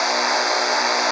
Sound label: moto